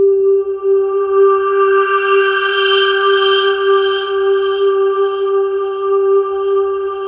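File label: atmosphere; Sound Gallery - Charged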